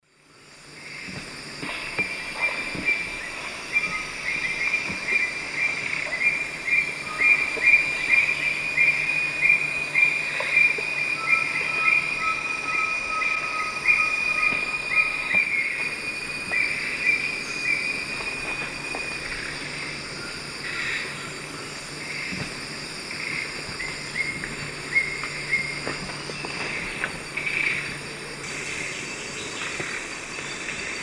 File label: Morphnus guianensis, calls; S. America; Eastern Lowlands